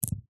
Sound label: industrial metal ball bearings x2 down on carpet